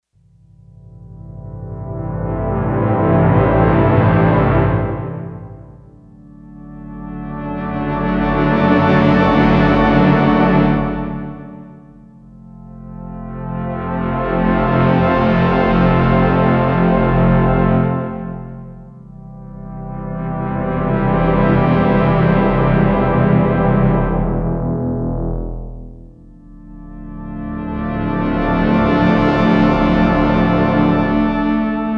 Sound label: FM Growth; Factory Patches; Voices